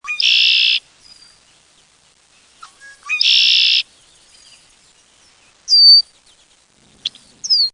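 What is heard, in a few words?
Blackbird Red-winged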